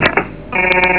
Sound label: chacka beep; xlogmaster